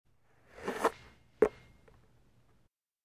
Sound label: Football Grab Finge